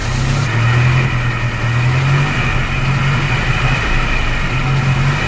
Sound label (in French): moteur